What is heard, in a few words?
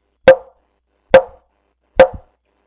1933 TB-1 Resonator